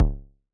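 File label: Bass Drum; Yamaha DJX-2B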